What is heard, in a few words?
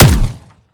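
weapon player; romeo870; gmod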